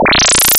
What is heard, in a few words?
warp, digital; 0.6s